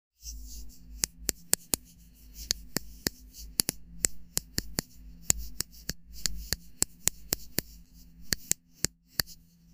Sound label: mobile